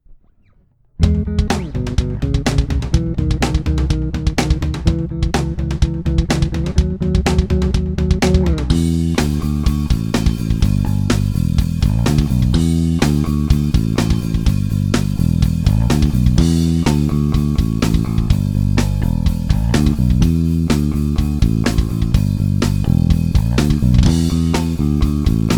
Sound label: clean, bass; 120bpm